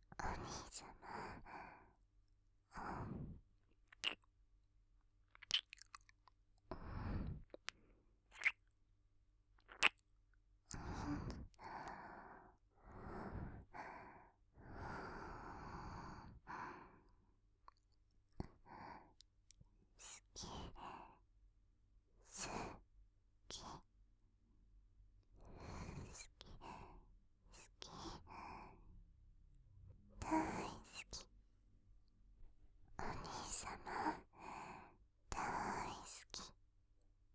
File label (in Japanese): スキ×キス; スキ×キス ご主人様が眠りに落ちるまで耳元で好き好きちゅっちゅしてくれるメイドさん; DL製作班